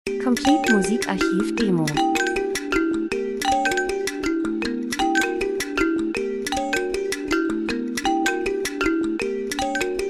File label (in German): drums, rhythmus; Percussion fremdartig Interessant Aufmerksamkeit Menschen Stadt Verkehr Reise Industrie Dokumentation; Stimmung